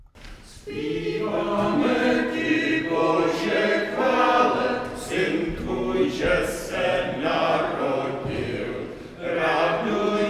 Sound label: fanfare